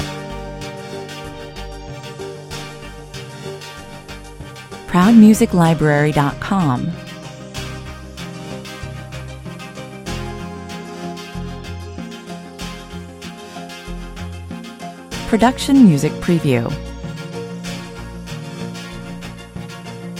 dance rhythm level loop

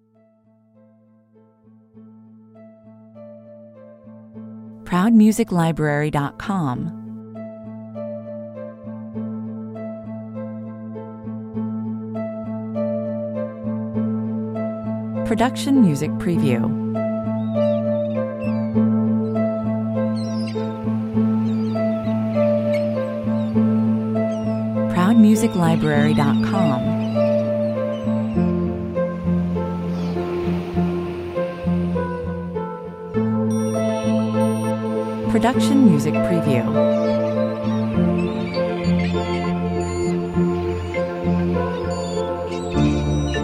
ocean flowers 100 bpm

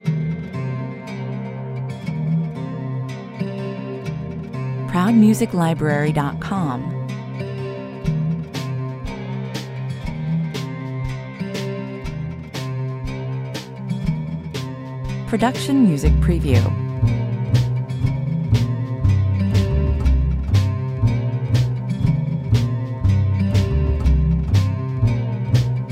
bayou swamp mystery 120 bpm

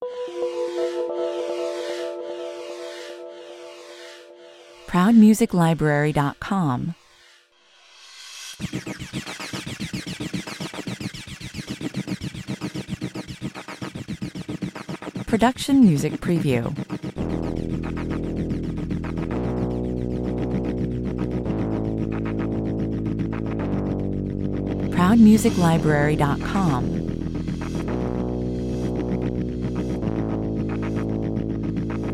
no drums 112 bpm

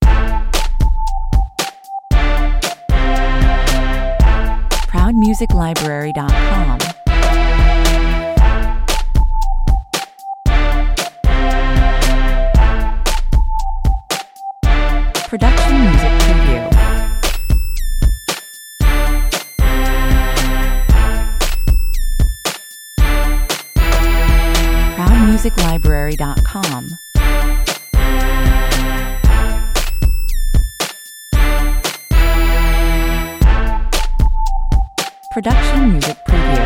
street beat 115 bpm

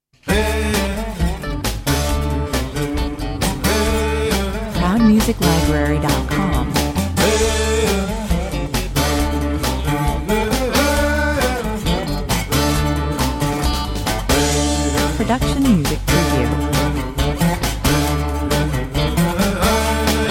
blues 135 bpm

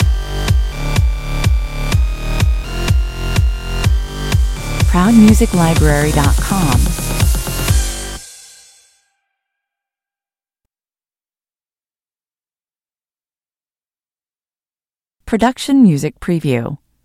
stinger

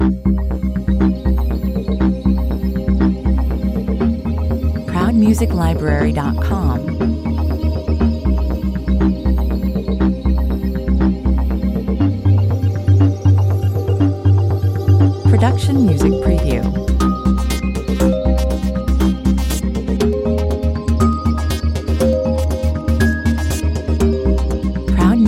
ambient mix 120 bpm

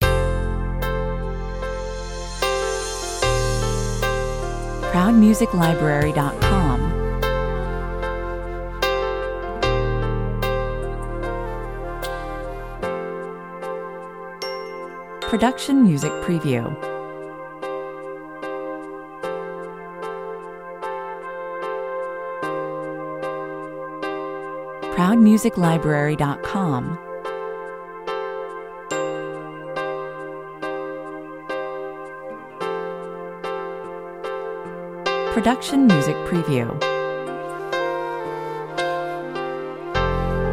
open book 75 bpm

